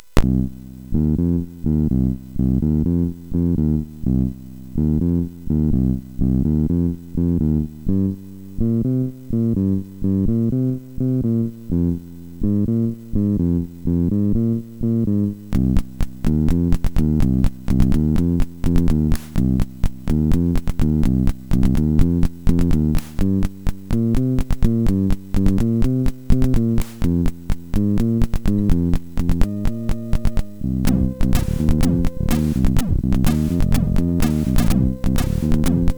Bluesbassline, MOS6581R4

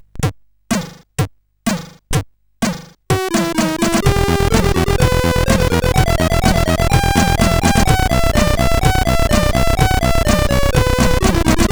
Techno, CSG8580R5; Senfonian

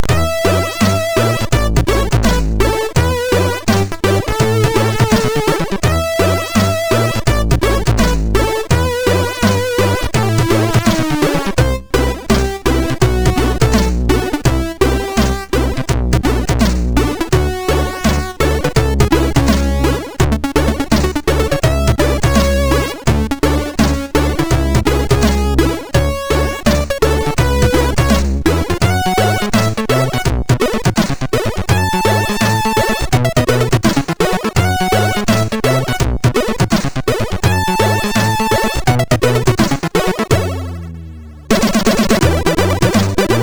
Funk, MOS6581R2

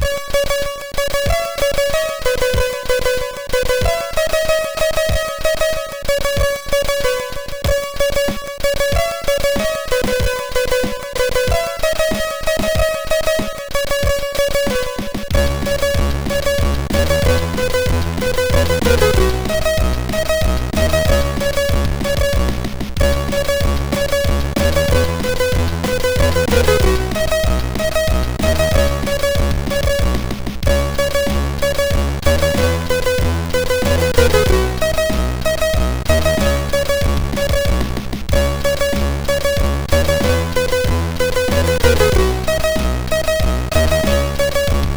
Piano Fun, MOS6581R4

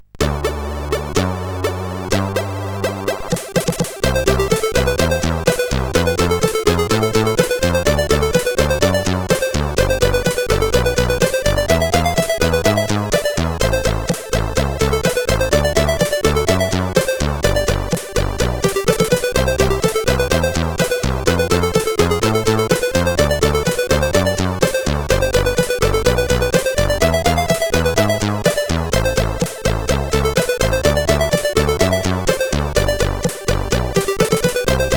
Luscious Ragtime; CSG8580R5

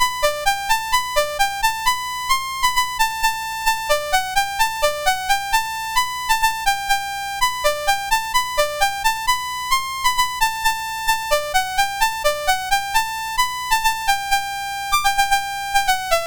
Piano BASIC, MOS6581R4

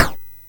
Mini Golf, MOS6581R4

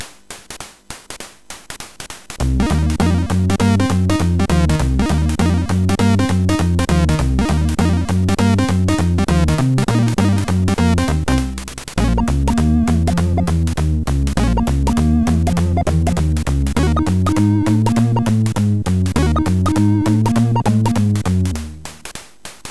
Jazz Miniature, MOS6581R4; Jazz Miniature 2; hvsc; Froyd

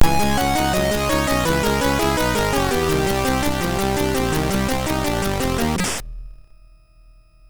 Sound Demo, sid MOS6581R2

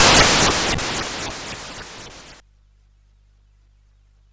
Frantic, CSG8580R5

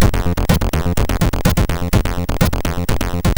Intro, sid MOS6581R2

